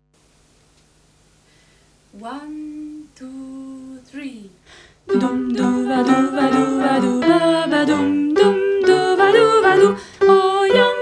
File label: Polonaise alto